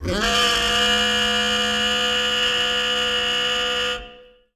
daxophone grogne

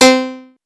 do piano

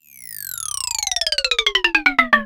xylo glis

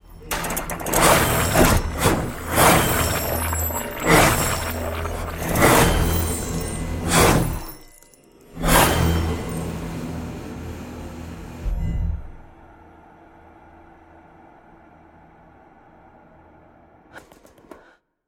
metal en bouche doux fort

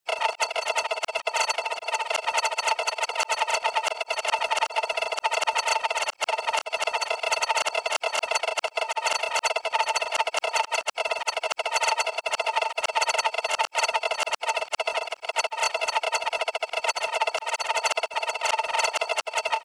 granuleux

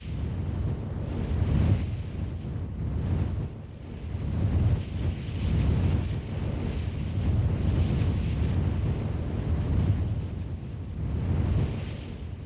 vent froid

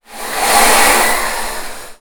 souffle crescendo

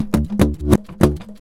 crapaud

